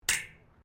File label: METAL ON METAL RAILING; Metal (Sound Effects:Materials)